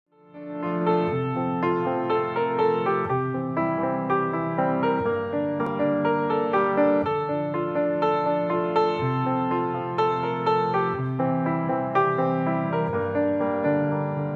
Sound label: Instrumental Pop; Barcelo Hotels recepcion